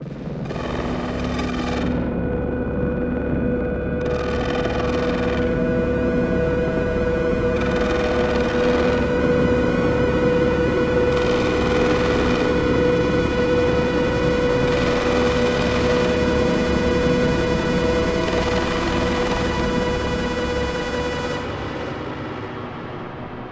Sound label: Ear Plug Amb, gamerip